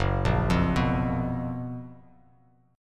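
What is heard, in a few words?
G flat Major 7th G flat; Gb-Major 11th; GbM11 arpeggiated; JGuitar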